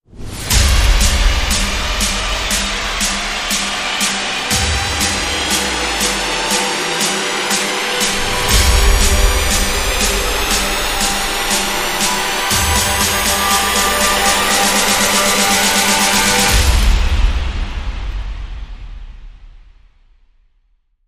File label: Rise In the Zone; Rise Rhy In the Zone